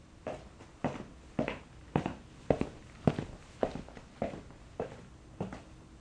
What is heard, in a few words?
Footsteps in Room